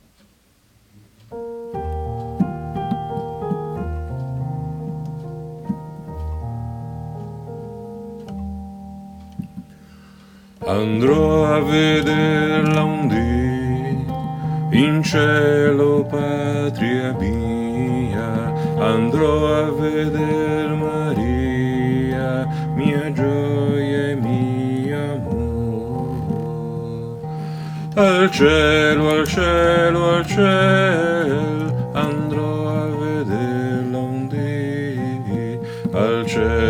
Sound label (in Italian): Andro A Vederla Un Di Contralti